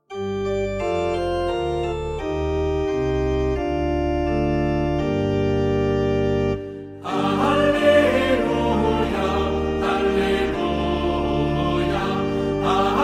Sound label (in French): Acclamation; rites adieu